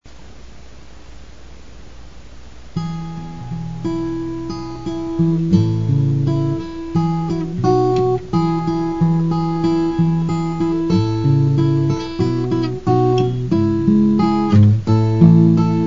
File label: Solo Key E